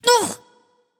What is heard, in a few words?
grunt hit